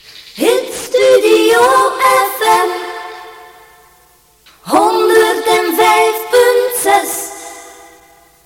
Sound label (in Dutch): Gezongen jingle a-capella; Hitstudio